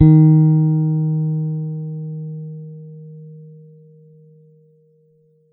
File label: Gs D#4 soft, Bass, Pedulla Thunderbass; Giga Samples Collection